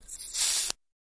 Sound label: DC Arc Across Knife, arcs & sparks